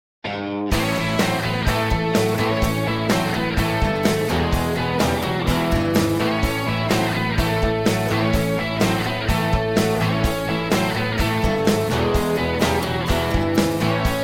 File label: Honky Tonk; Soundzabound